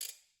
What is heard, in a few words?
Ratchet Crank; Idiophones; Struck Idiophones